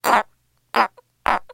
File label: Frog Australian Tree